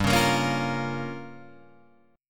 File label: G Minor 7th Flat 5th, Guitar, Open G; chordshape; down